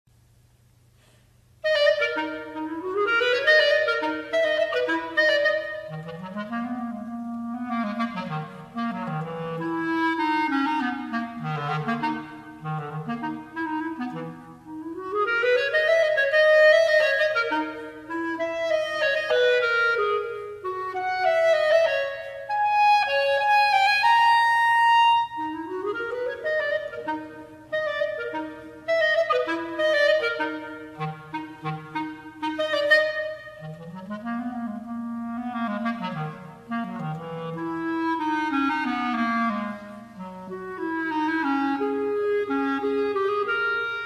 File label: Excursions for Solo Clarinet, Allegro con fuoco